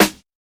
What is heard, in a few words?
reverb acoustic snare sound d sharp key; snare drum samples